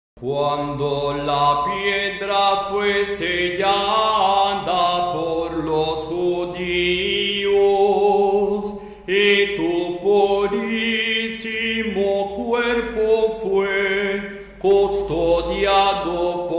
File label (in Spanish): Tropario de resurreccion tono 1 canto bizantino; ecuador